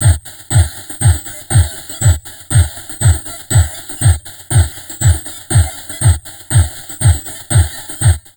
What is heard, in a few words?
Drum Loops Crazy Processed